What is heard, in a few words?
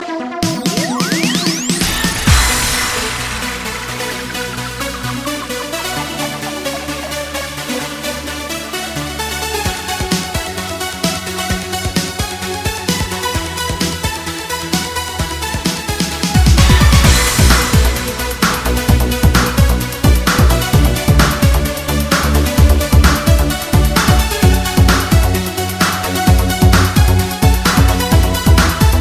Electro